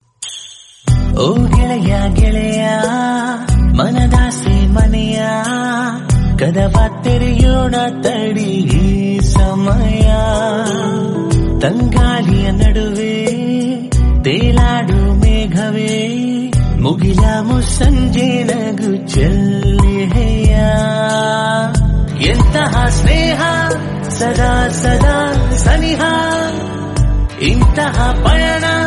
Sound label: Kannada Song